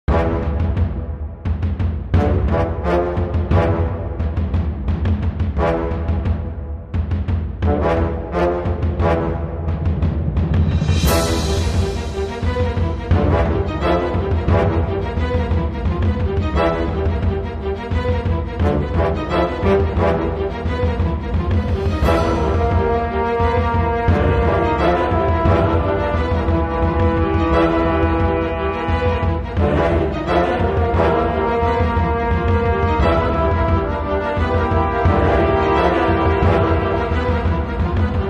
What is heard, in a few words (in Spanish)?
TENSIÓN Música de tensión tipo Hollywood